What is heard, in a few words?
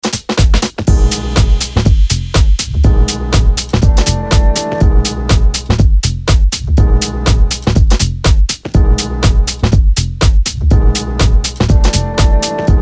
Rhythm